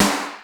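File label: Snare Alloy, Alesis D4 drum machine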